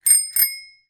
transport bicycle bell ring; zapsplat

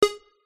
multimedia game sound synth digital tone beep

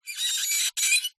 vibrations cello bow styrofoam cooler short; sound spark

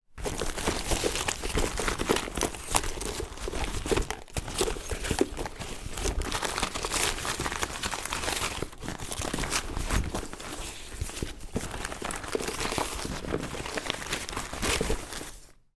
rummage through handbag

